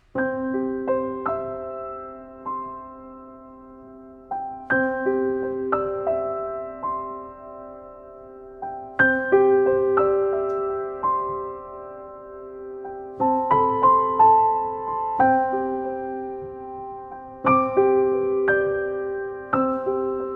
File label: A Bicycle Built For Two (Daisy Bell) [Instrumental Version]